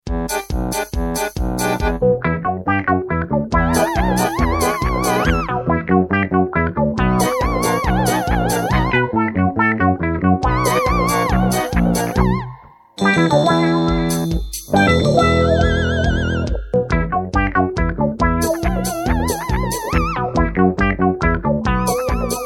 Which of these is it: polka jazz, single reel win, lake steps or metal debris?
polka jazz